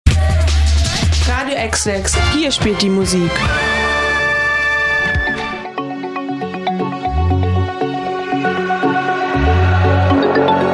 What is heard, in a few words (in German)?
Techno Podcast; Der Exlex Technopodcast Folge 5; Artique